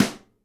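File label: snare nylon damp, Live Snares; E-MU Producer Series Vol. 8 – Platinum Phatt (CD 1)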